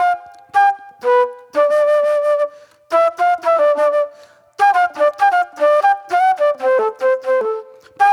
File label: Sweet Soul Flute